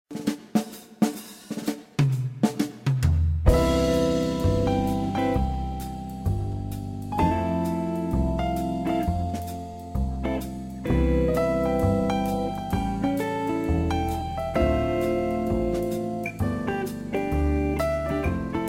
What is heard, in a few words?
instrumental